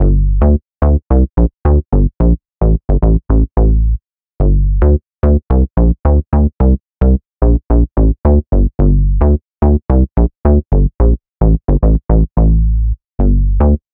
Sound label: Sine, Bass, Bounce, Catchy Melody, G minor, 8 bars, 110BPM; Audialab EDM Elements